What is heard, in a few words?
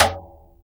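surdo rim, ethnic percussion; Drums In Your FACE!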